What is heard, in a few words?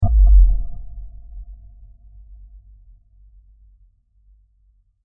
Long Bell Impact; Low Impacts; Crafted Signals